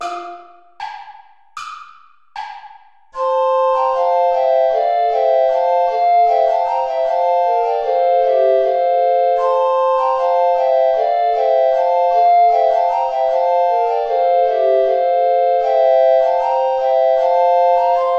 traditional play along without bass recorder; schma ckt den saal mit gra nen zweigen

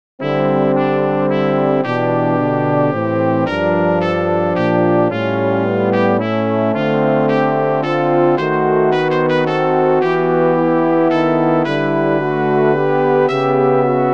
Silent Night, a variation for brass

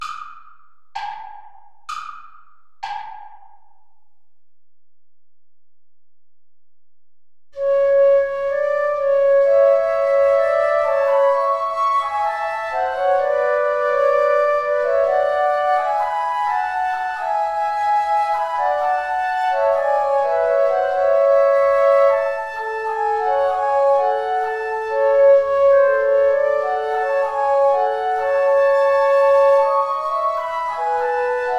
tango play along without guitar; albeniz isaac

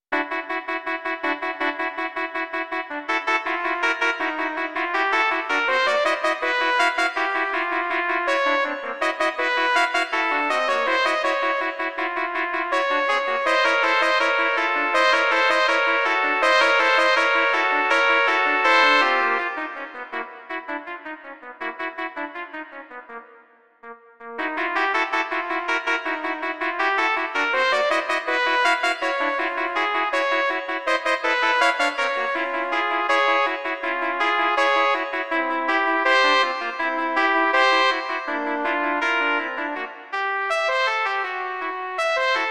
suite for three trumpets allegro giocoso

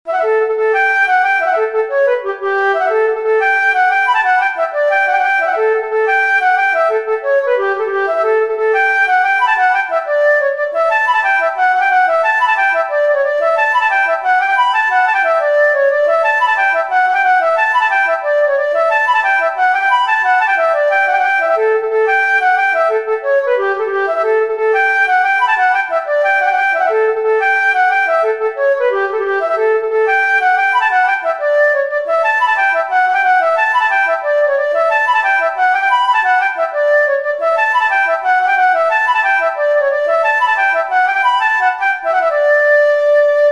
reel for flute; anonymous cup tea

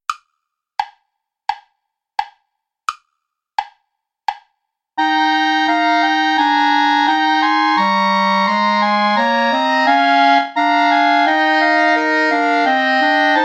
play along without clarinet

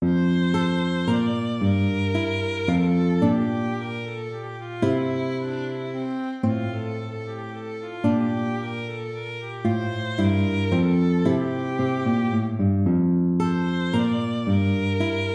minuet major

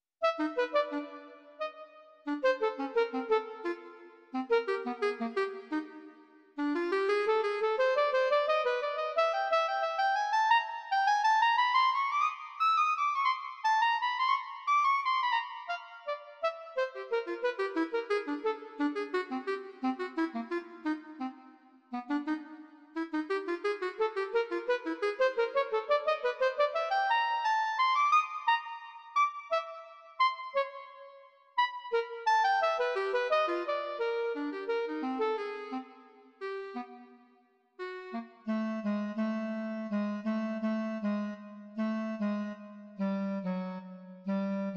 for clarinet animato

